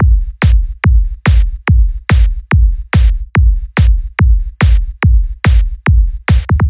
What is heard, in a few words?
Basic Psychedelic Trance Beat Loop; Sirius A